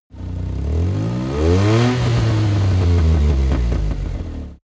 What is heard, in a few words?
car buggy rev up